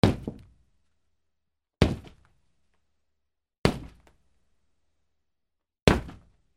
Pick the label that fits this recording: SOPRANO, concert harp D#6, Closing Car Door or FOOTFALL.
FOOTFALL